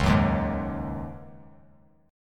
Db-Suspended 2nd Suspended 4th-Db-down-Bass Standard; strummed; JGuitar